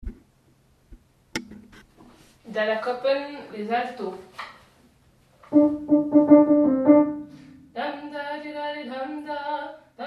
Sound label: alto; dalakopen